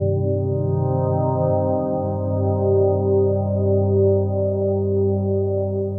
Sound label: SYNTH PAD AMBIENT 160 C, Ambient; Sphere